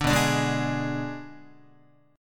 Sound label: B Minor 6th B, Guitar; Bm6 strummed; JGuitar